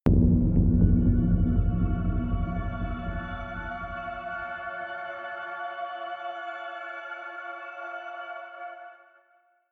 horror shimmer, drones

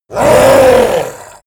orc scream; schreie

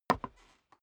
step boots wood creak single stair down; schritte; Footsteps

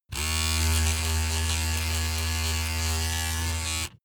razor on load pov short

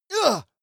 male combat hit soft; schreie; menschen; soundpool

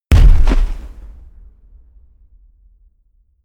explosion fuel; explosionen; comedy-cartoon; soundpool

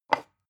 glass pick up clatter hard; objekte

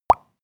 pop bubbles; soundpool

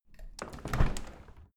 window handle open soft; objekte; fenster

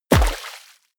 bullet large impact water; krieg-waffen; geschoss

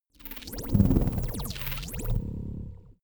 sci-fi vehicle alien chopper short; raumschiff

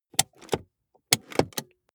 car bmw e46 interior handbrake release long

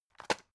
drug package drop deep; berufe; arzt

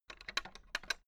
fence gate latch rattle long; objekte; tueren

